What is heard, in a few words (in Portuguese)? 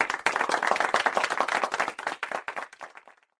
aplauso fraco